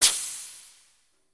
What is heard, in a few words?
air release